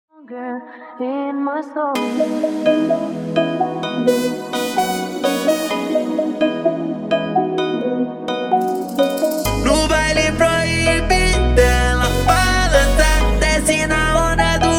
sertanejo automotivo

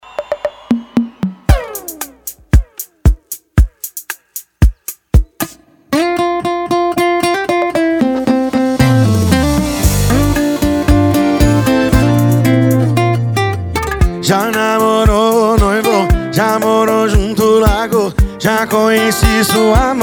sertanejo

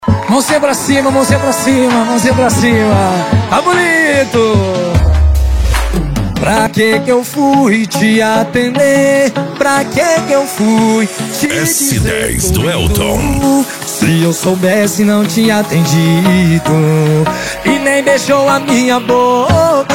sertanejo

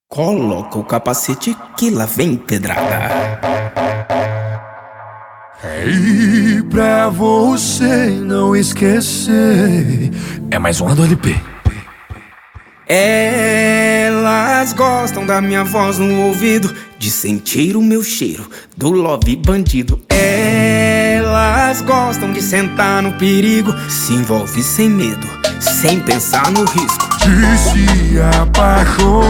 som automotivo

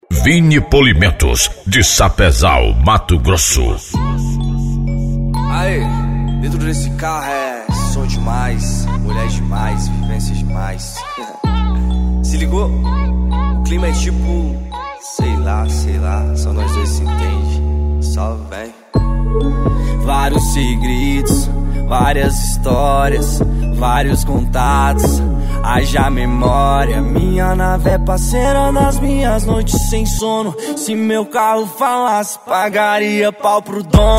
funk e rap

